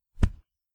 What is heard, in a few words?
Punch